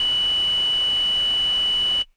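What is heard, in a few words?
Air Bass Kawaii F#2; keys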